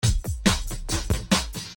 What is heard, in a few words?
Trashy Drum loop